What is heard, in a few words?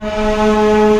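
STR D#1 PP, strings; Sound On Sound - Peter Siedleczek's Orchestra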